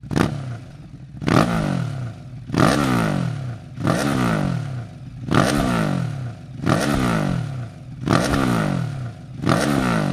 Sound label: hypercone slip on ducati hypermotard939 sp; remus